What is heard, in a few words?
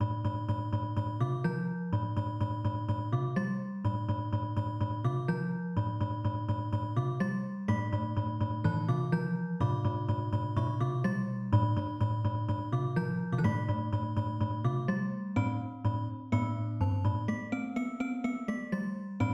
celesta